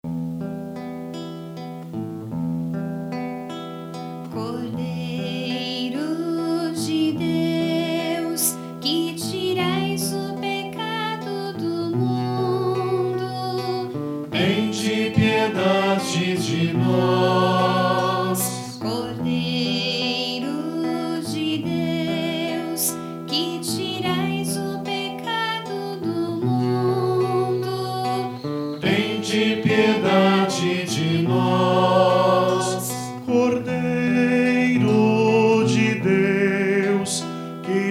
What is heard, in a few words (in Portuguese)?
cordeiro